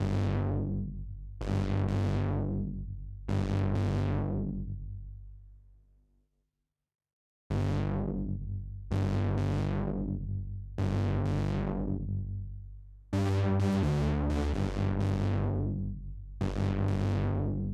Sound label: BASS